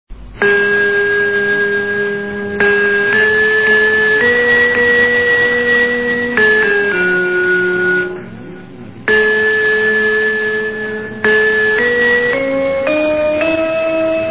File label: interval signal; rtbf1 c1998